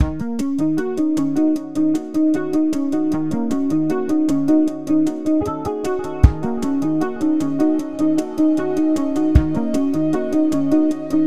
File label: EMISYNTH; Strix Instruments LLC